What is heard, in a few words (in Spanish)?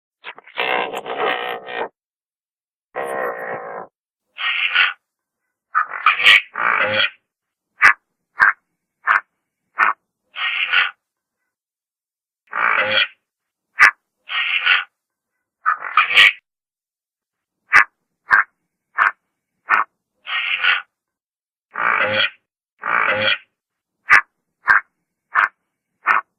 sonido del pelicano; sonidos cortos de animales